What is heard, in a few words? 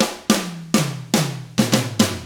110 SAMBA, Drums; ILIO; Double Platinum Drums 1